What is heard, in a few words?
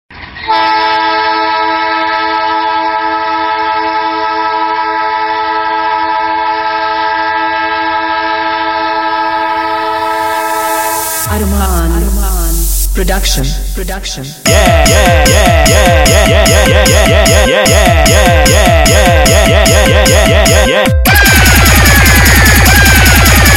Train Horn Vs Noise Compitition Music Remix